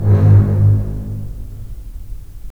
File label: STR, STR Full Short; Roland - LA Composer vol. 4